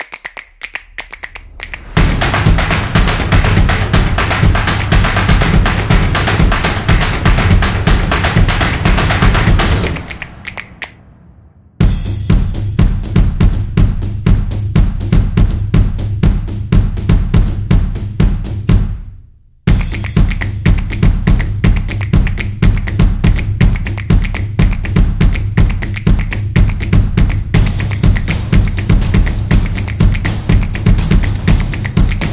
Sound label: Rhythmical